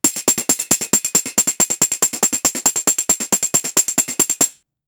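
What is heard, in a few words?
create a cheerful and energetic, triumphant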